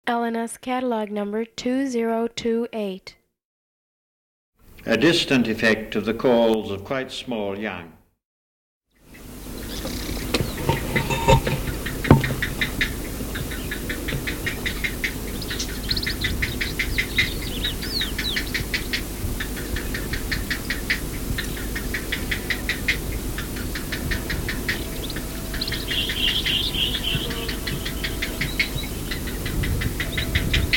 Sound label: goliath heron